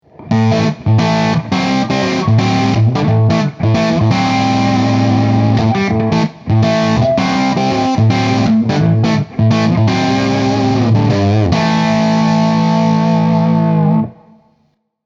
Artisan CH1 Hi Input Vol full Bass Shape 4

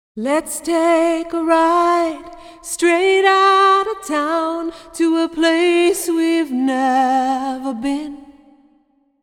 Hall Vocals

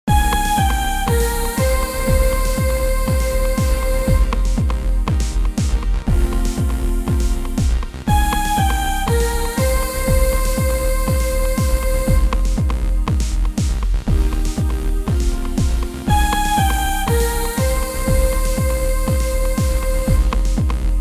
UK House Style Pad Vibe

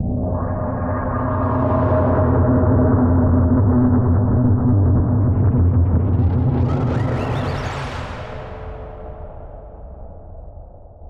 Scenic Hybrid Instrument Insert Saturation; Reason Studios Reason 11

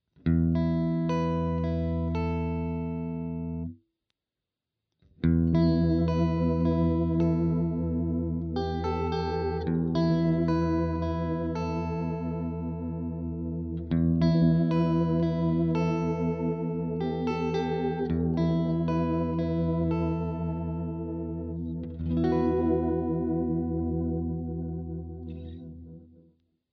Riff; FABLE

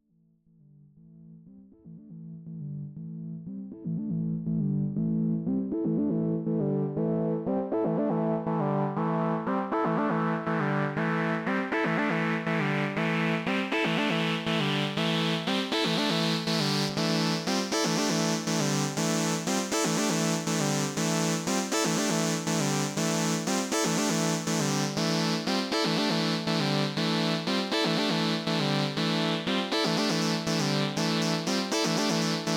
dreadbox Erebus V3 3OSC Chord

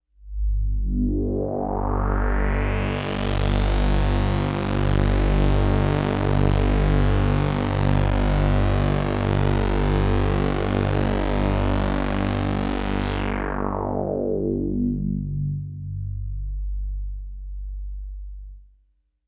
Basic Moog Sweep

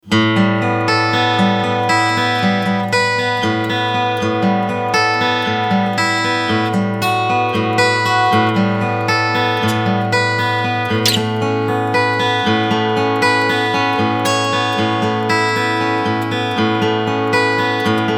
Picking

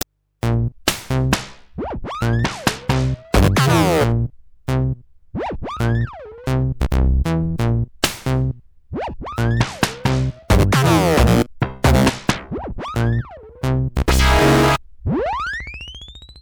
mx synth

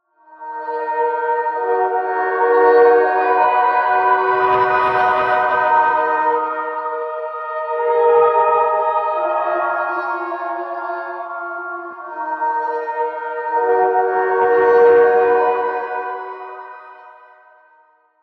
Pads Triplet Crunch

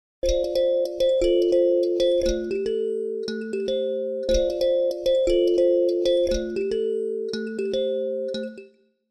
Kalimba; Hugh Tracey

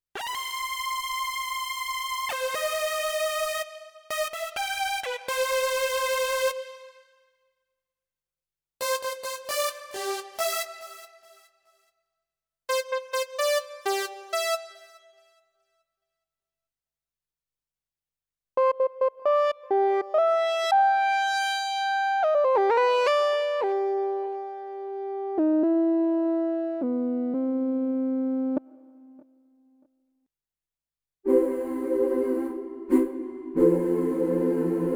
Pad Synth Choir

